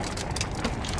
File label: minecart base